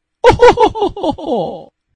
hohohohoho; freeza

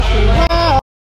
woooahhh

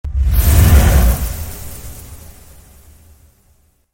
fireball sound

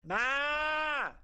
meeee, goat; pisiko

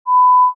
censor

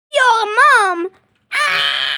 ahhhhhh

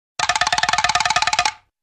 rapid wooden run

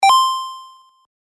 plain 8bit

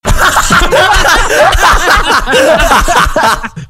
laughing; vanoss

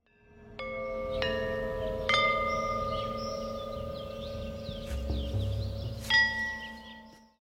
bells

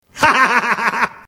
loud laugh; lego batman

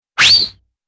whistle streak out

